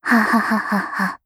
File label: laugh; Eternal Return; Katja; KOR